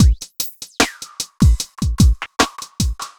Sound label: evil beats 75